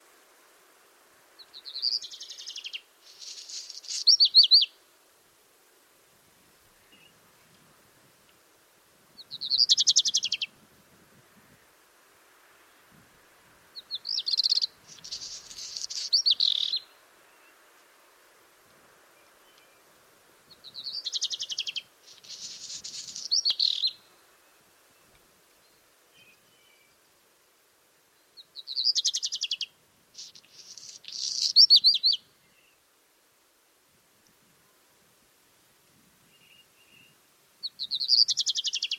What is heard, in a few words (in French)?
rougequeue noir, carrieres romaines; chants d'oiseaux; vercors; enregistrés par Jacques Prévost de la LPO